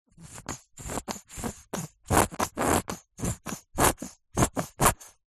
household hair gel squeeze from plastic pouch squirt; zapsplat